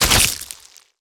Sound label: blade cut medium, knife